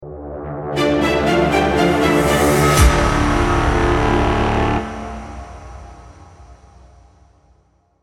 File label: cinematic logo